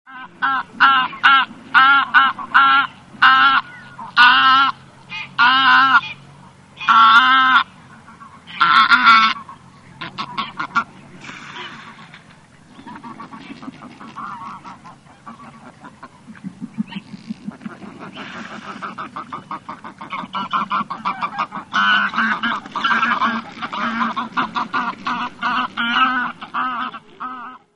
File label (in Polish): gęgawa - głos